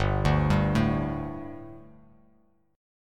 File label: G Suspended 2nd G, down; Gsus2 arpeggiated; JGuitar